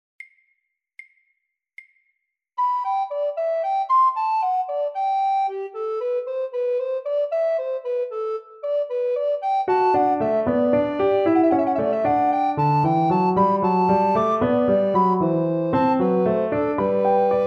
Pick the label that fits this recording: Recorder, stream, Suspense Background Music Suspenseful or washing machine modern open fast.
Recorder